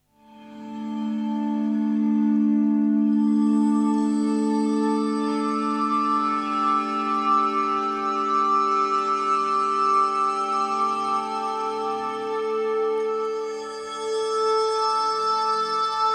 iridium morpheus ice pipe

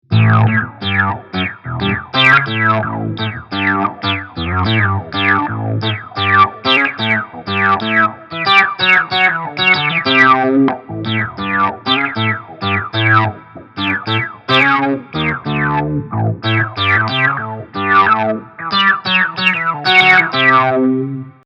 Filter

